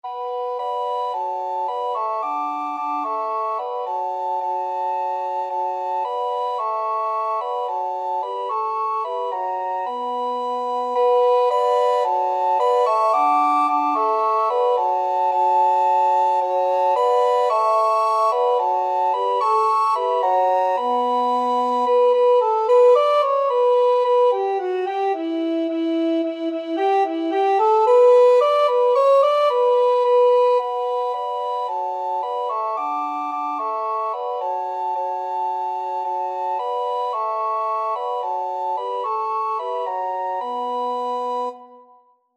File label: gaudete, recorder quartet